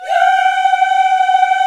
VOX, African Yas; Roland LCDP11 Africa VOL-1

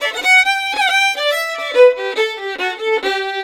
VIOLIN, Celtic Flavours

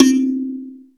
sanza; SN-R8-07 Mallet

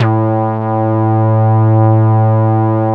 PL MOOG; Wizoo - Powered Nord; STEREO LAYER

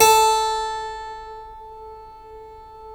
A 2 CLAV; USB Soundscan vol.17

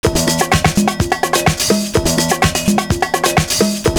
SOME PERCS, loop; Univers Sons - Kitch 70s

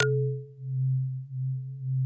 MALLET PADS; Pro-Rec - Synthsonic

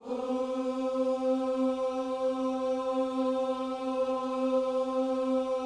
OOS C 4A, gospel; Spectrasonics; Vocal Planet

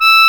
CONCERTIN, Concertina

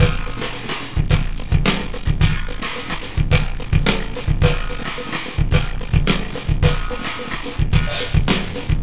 System Overload Beat, Processed Drumkits

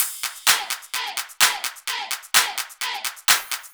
Loops 128BPM, Drumloops

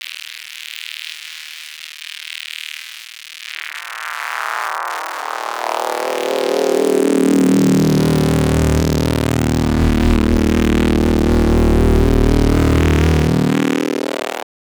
Filter Me, Pads; KJ Sawka; Drum n Bass & Beyond

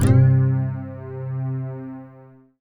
C2, SYN JD; Best Service Gigapack 1

